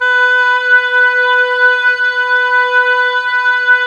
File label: OBOES B, Oboes, Wood Winds; Miroslav Vitous - Woodwind Brass Ensemble